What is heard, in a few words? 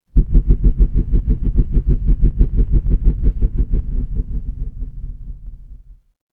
mechanical engine